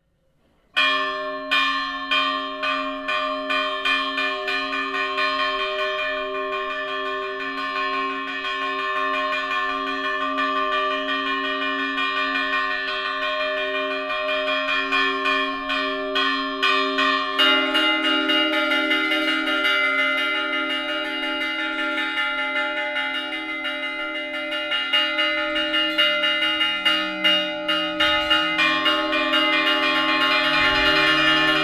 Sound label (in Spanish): Repique Marcon